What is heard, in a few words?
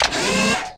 hydraulic; gmod; lfs luna s flight school; laatc atte